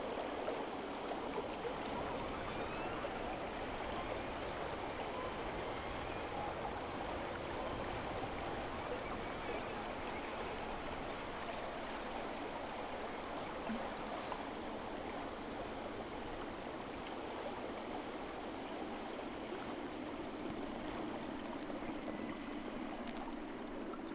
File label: Whales; SeaSnake